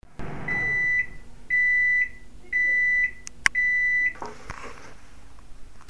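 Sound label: microwave sounds; zvuki mikrovolnovki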